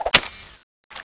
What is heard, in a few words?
clip in, weapons; vulcanus1